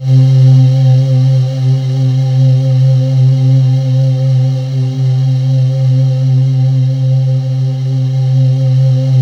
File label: C3, Synth Leads